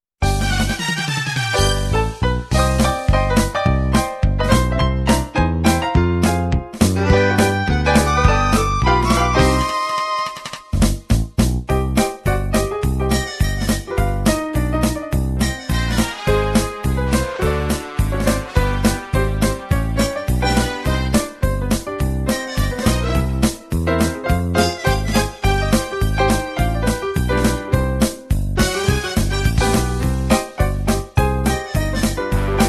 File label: brass minus